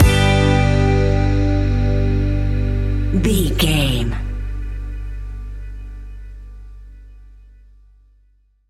lofi relaxation stinger, A relaxed and chilling piece of music; great for light documentaries, background music for tv shows